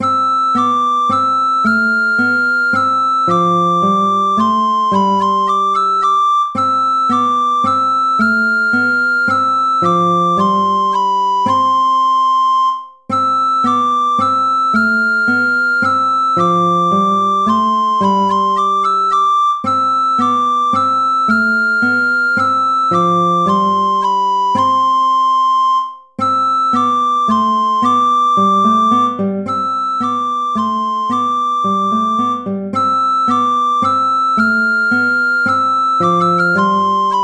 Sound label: tempo minuetto; hook james